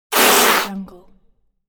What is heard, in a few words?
cat hissing